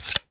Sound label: bow take arrow; deathlegends